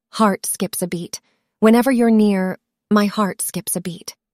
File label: beat